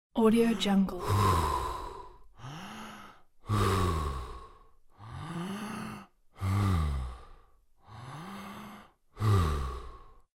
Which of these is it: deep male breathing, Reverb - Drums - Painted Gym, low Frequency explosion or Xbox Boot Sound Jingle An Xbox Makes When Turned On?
deep male breathing